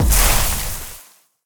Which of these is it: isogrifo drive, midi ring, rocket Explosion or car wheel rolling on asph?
rocket Explosion